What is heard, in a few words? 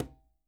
Quinto, Percussion